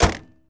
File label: Truck Durango Hood Close; Trucks